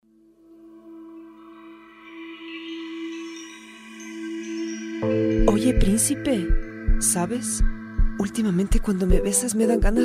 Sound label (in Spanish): Hip Hop